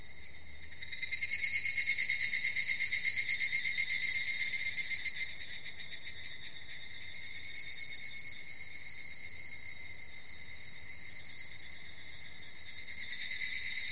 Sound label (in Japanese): ヒグラシ